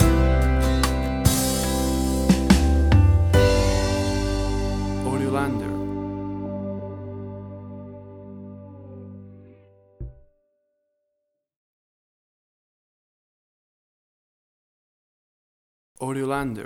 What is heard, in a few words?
Chilled Out Nashville Pop Stinger